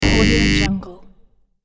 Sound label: wrong low buzzer